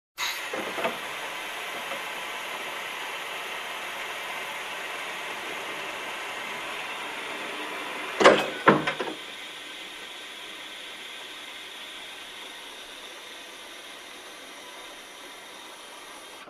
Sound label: Industrial Machine usage sequence